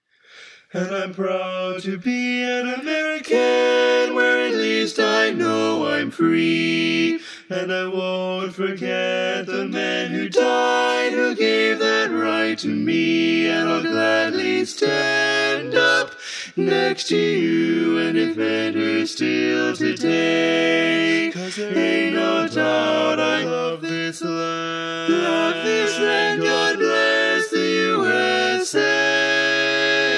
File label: Tenor